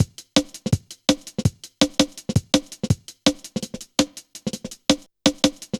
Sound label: Skippy, Drum Loops, Jungle; Zero-G Jungle Warfare 1, 2 & 3